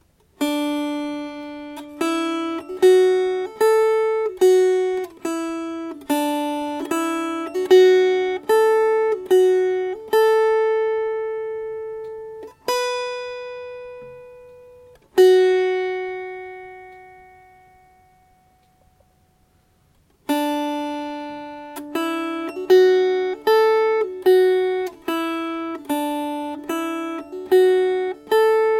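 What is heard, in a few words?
Melody, Dulcimer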